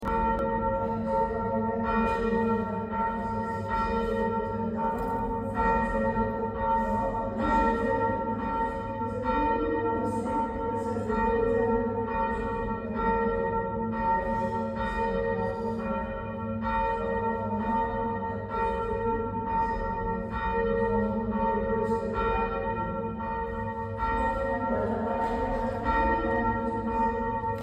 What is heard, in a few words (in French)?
CLOCHE INTERIEUR; MOUGON 79 EGLIS